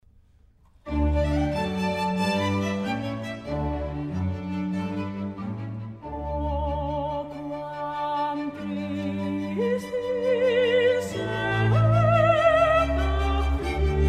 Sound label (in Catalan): Stabat Mater en Mi Bemoll Major O quam tristis et afflicta Largo